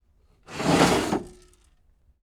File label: door slide open close